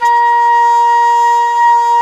WND FLT MT, Flute & Mute

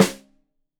Rim Shot Stereo, drums; Deeply Sampled Drums

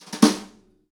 New Brush Snare Phrase; Snares

